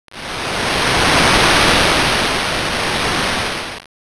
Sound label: wave